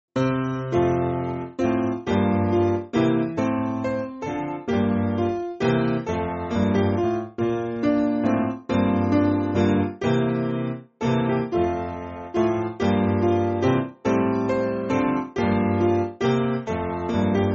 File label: Piano; We Cannot Measure; Ye Banks